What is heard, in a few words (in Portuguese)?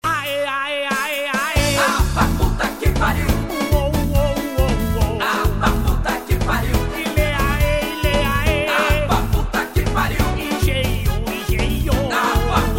musica anti stress